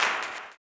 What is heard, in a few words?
CLAP, Blues Soul; Spectrasonics Vocal Planet